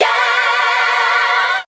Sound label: "yeah" sung